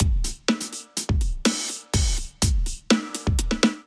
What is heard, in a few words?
Kit groove 124 bpm, Drum; MIDI Clips; Sense 7 Kit groove; Ableton; Factory Packs; Drum Essentials